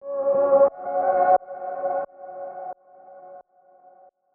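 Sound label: 88 F minor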